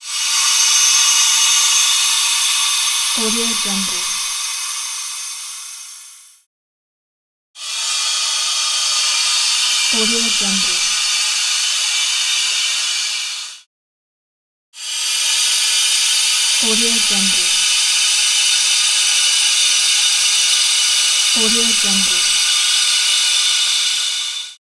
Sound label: water pipe